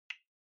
Smartphone Typing Speaker Single

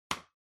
Trackpad Click, Far R Hard

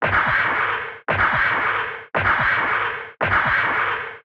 Retro Anime Robot High

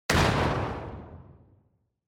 Motion Slam Heavy

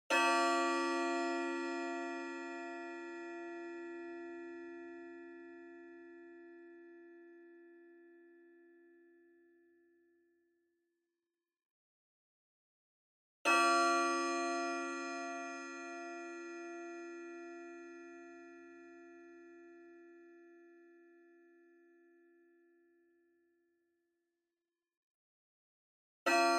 Pendulum Clock Chime Low Set

